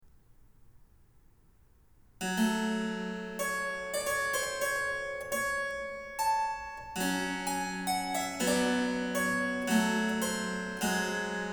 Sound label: Harpsichord; Bach, J S; Goldberg Variations; BWV 988